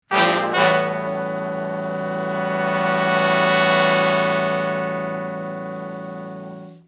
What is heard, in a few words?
Shf Blus Thick End Chds [bII, I] (A), Horncraft for R&B; Sonic Foundry (Sony Creative Software); Sections